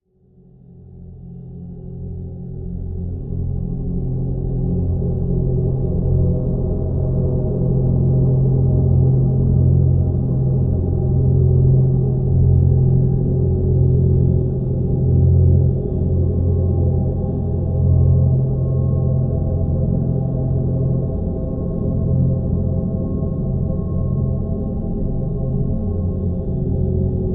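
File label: drone F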